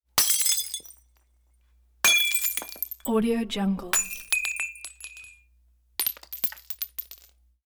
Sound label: wine glass break glass smashes; transitions and movement